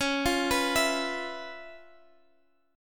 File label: Db 7th Sharp 9th Db, down, Guitar Open F; Db7#9; arpeggiated